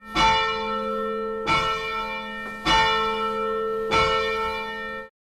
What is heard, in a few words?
Portuguese Church Bell Ringtone